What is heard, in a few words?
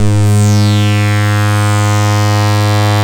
REZ SYNC, ARP Synths; E-MU Formula 4000 Series Vol. 3; Analog Odyssey